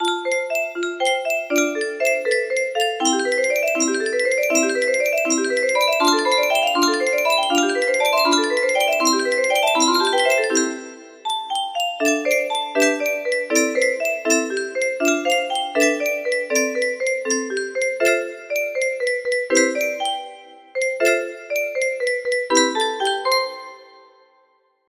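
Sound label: music box melody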